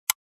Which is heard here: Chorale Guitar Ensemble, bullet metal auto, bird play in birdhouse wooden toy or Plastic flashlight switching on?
Plastic flashlight switching on